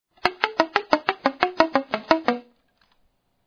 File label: slap, sax